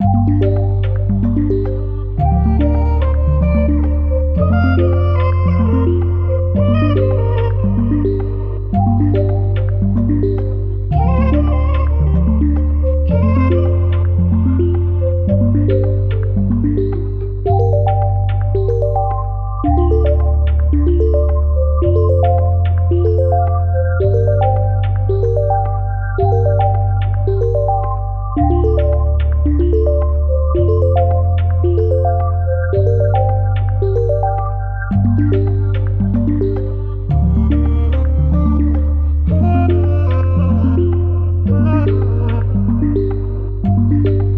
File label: 110 BPM G Min, Melody; Saint Tropez; Cymatics; Infinity Melody Collection